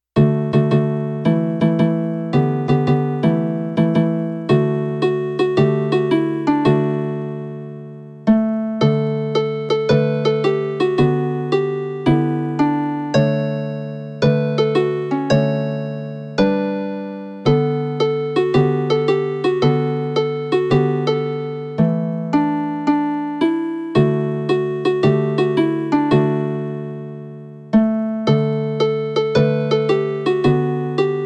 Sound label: raindrops dulcimer